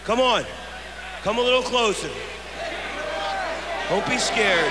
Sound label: scared